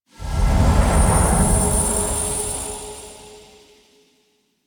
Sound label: Amphitheatre Show Transition; Warframe; Duviri